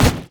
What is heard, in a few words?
ancient golem basic attack; monsters